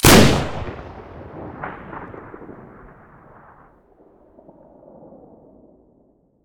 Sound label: aa12 muzzle stereo, weapons; STALKER; IWP